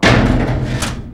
IMPACT

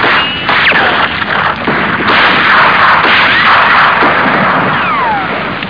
Gunfight

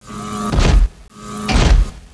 Footsteps, Robots; Gunlok

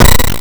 ALERT; AdAware

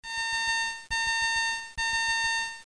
alarm

